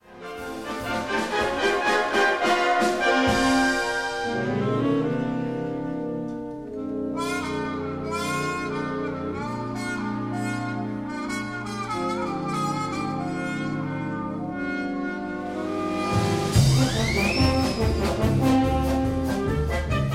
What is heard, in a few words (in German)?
cartoon sample, Blasorchester